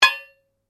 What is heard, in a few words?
Blade Heavy Impact, Sword; Foley sounds for melee weapons; Combat